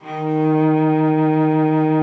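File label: D#3, cello vibrato; Xsample VOL-7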